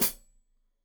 Hat Foot, Hi-Hat, Cymbals Stereo

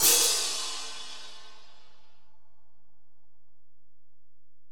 Ride, Alesis DM-PRO, Drum Machines

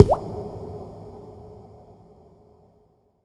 SPACE-FX

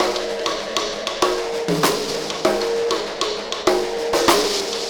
Ripple Perc; Sonic Foundry (Sony Creative Software); Processed Drumkits Zero Gravity Beats

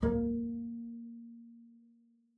A#3, bass pizz; Xsample VOL-5

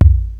TR, drums perc, Roland; Rubber Chicken Software Sample Bank

